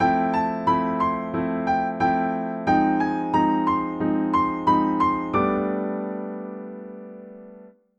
IV m hybrid

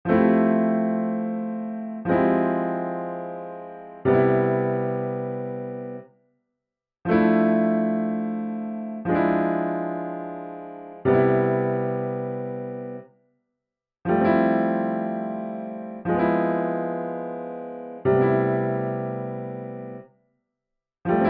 C4 tension IVmM7

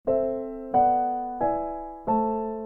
parallel major cadence

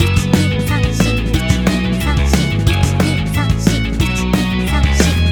BPM 180

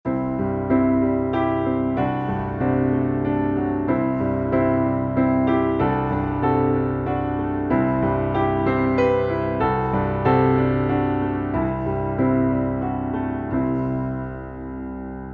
m3 lydian C D

